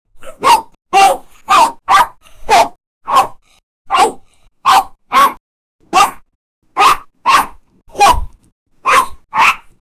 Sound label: 7 years old boy giggles; child